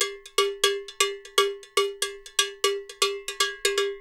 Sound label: Percussion, 120; Sampleheads; NYC Percussionworks; 90 s Sample CDs